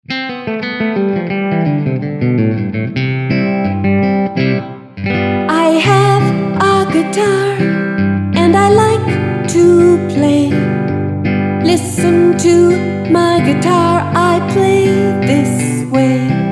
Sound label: my guitar